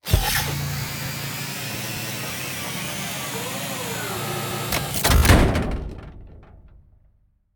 reload, ships combat; CosmicRage

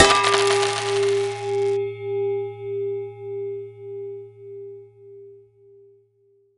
item sold, Auction

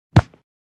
collapse; CosmicRage

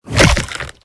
flesh; combat; big bite; CosmicRage

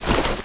build; CosmicRage; ships ratika

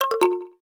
speed walk off; CosmicRage

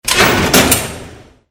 door open

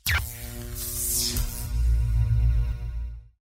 shield, toys; Cosmic Rage

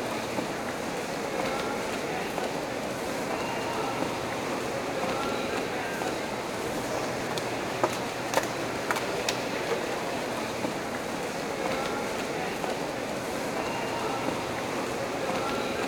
plaza, ambiances; Cosmic Rage

